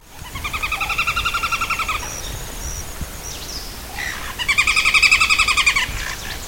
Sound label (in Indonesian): pelatuk besi